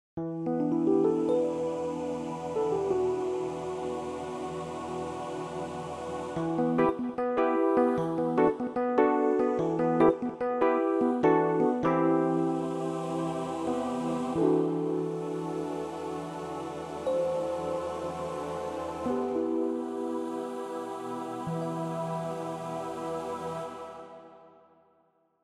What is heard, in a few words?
e pian choir